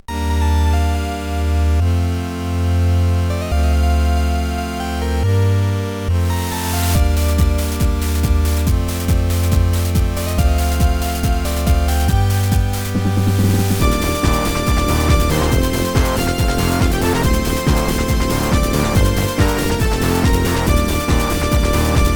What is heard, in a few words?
vrc6 vrc7 something that sounds very anime esque